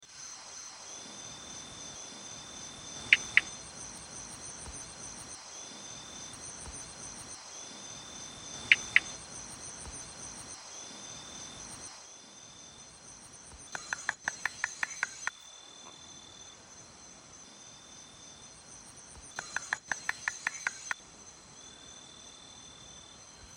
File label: polypedates discantus, malayan slender tree frog